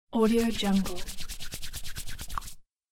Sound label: butt skin scratching rubbing; human sounds